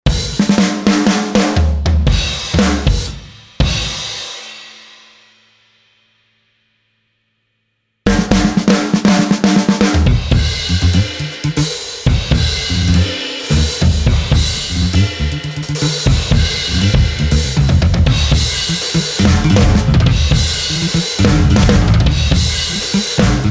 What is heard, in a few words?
DRUMS